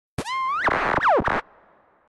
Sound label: sms and mail; Korotkiy zvuk na SMS.